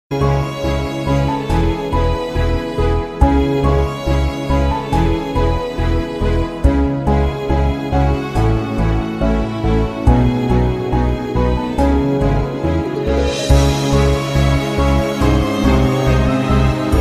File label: Instrumental